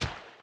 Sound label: landfill inventory pickup; factorio 2.0.55